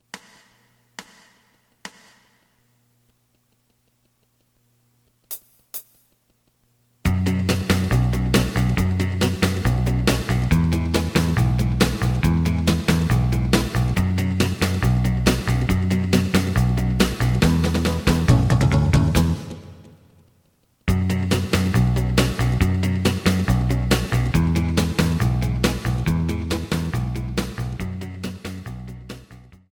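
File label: lead guitar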